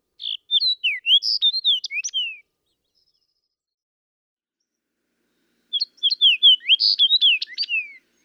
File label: Fox Sparrow